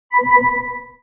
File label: ping